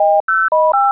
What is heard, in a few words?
keycode; svencoop; extended